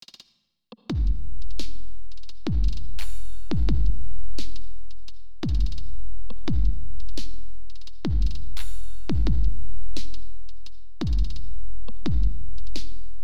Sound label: HaloVerb NonLin 70 Wet; Metric Halo v4; Drumazone2